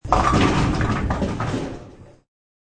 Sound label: Bowlng Pins Shrt, Bowling pins knocked down.; Part of Bowling (Sound Effects:Sports)